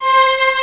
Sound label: PIANO vol; PT Support archive